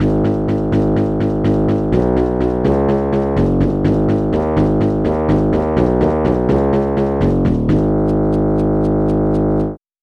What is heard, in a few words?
trumpet bass